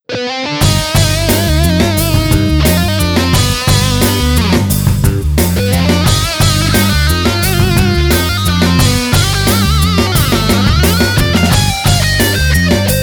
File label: patch demo